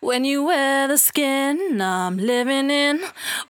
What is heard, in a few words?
68bpm D, Velvet Vocal; Zero-G